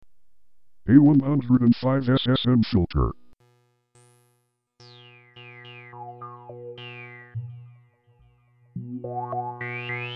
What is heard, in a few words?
SSM Filter